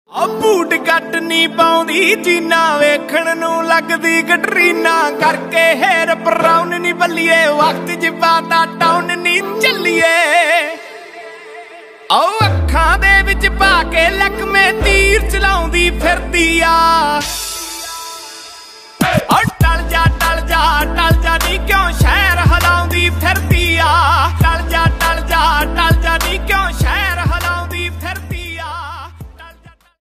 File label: Punjabi Ringtone